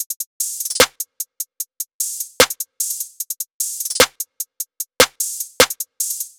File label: Top Loop - 150 BPM, Drum Loops; Cymatics; Cobra Hip Hop Sample Pack